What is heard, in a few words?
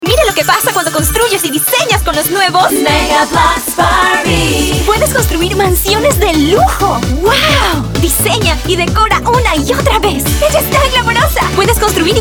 Jingle; MegaBloks Barbie